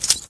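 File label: grenade pin pull; video games; weapons; source engine; dod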